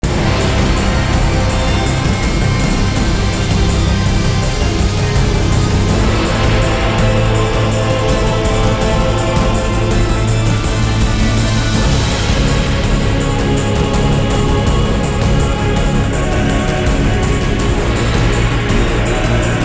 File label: music